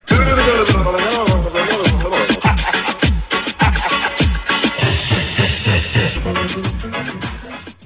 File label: hahahaha